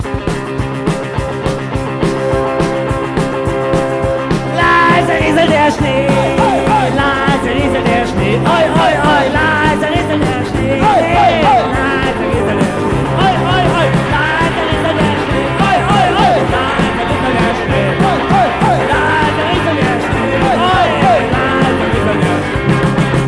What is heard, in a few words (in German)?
Punk